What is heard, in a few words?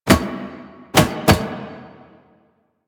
Bang On Mask Impacts; Warframe; Quests; The New War; Kahl; Foley